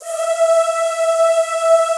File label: ZZZ E4, Classical Choir; Best Service; Extended Classical Choir